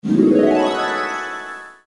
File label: zhongjiang